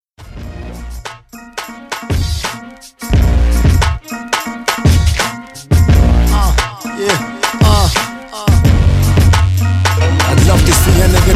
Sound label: remix